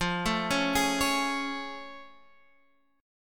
A Augmented Major 7th F

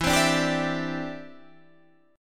E Minor 7th Sharp 5th, down, Guitar, Standard; strummed

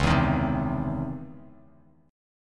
C sharp Minor Major 9th, Bass Standard; C#mM9; strummed; JGuitar

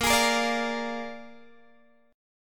A sharp 7th A sharp, Guitar, Open G

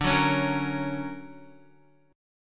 D Augmented Major 7th down Guitar

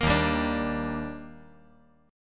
Eb Major 7th; EbM7; strummed; JGuitar

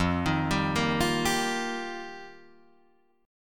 F 9th Suspended 4th F Guitar Drop D, chord

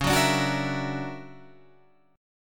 D Augmented Major 9th Db, guitar

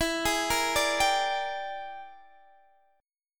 E 7th Sharp 9th Flat 5th Guitar Open D, chord

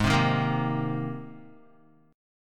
G sharp Major Add 9th G sharp, Guitar; G#add9 strummed; JGuitar

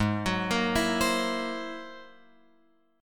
Ab Augmented 9th Ab Guitar Open F; arpeggiated; Ab+9; JGuitar

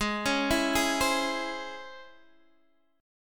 C sharp Minor Major 7th, chord

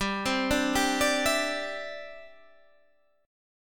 D 9th Suspended 4th, chord

